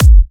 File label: Bassdrum